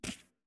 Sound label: Walk; portal quest; android; Antihero